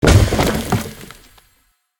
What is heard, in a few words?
boost Add FS; rage of the seas